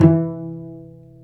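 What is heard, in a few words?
strings cello pizzicato, dry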